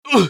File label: usec wound; eft; scales